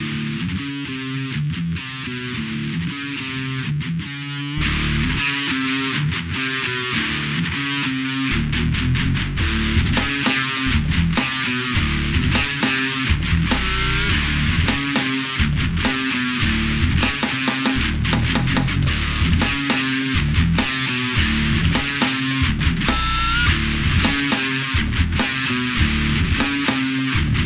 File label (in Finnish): riffi